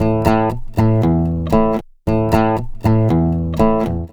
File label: Oud, Arabic; Sonic Foundry (Sony Creative Software); Continental Drift Aftershocks